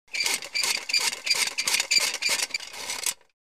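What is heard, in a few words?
Metal Cranks Flour Sifter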